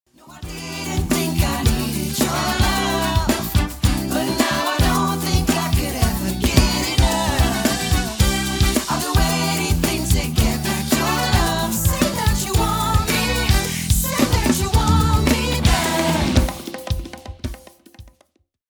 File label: full band re-recorded Horns